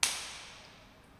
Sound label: clap natural echo hallway; single hits one shots